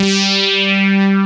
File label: SAW LEAD; Pro-Rec - Synthsonic; TRINITY